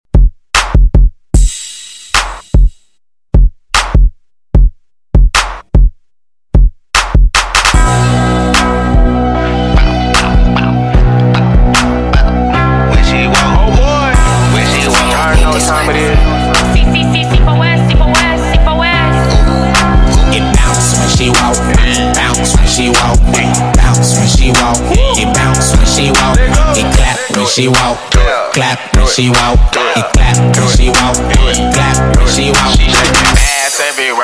Re Drum 75bpm; DjRald

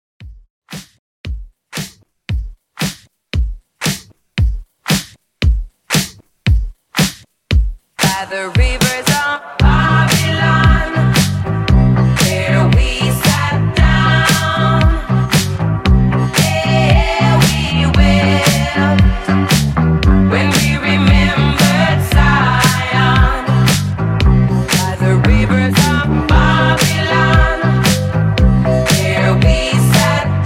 70s Re Drum 115

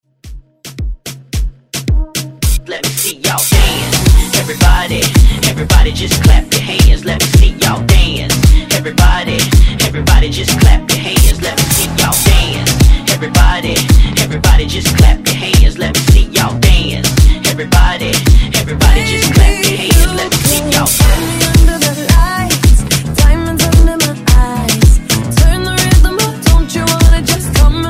Moombahton Hype Redrum

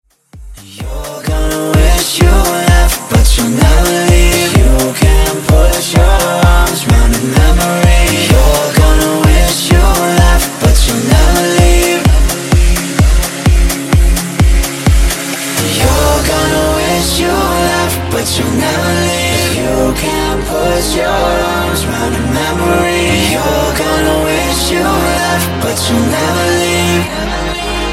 Funk Hybrid Bootleg 128 Bpm

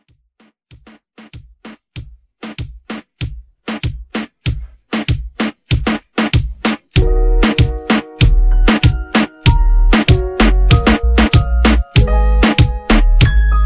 Reggaeton Beat Intro

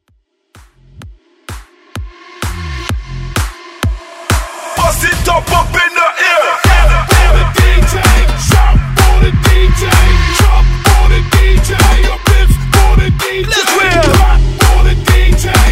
Jump Hype Str8 Bpm

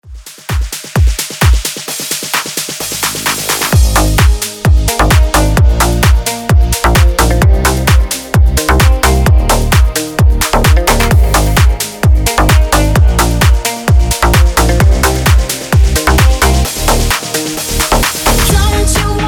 future house breaker 130 bpm

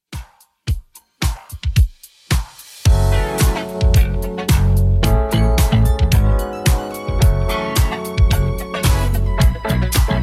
80s Redrum, 110 bpm

